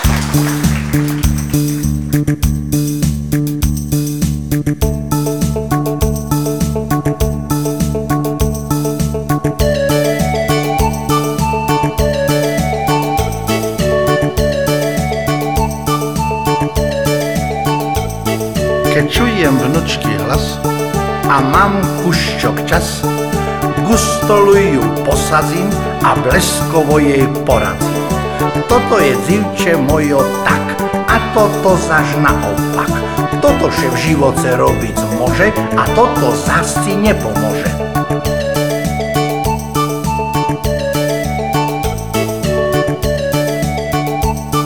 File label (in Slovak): pieseň; Ander z Košíc; Uc se dzivce mojo; Kec čujem vnučky hlas